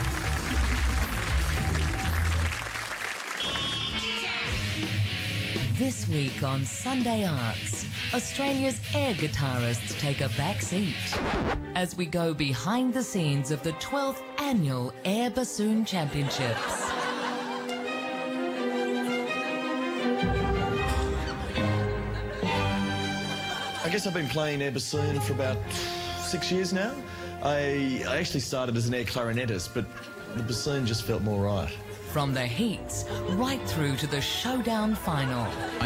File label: air bassoon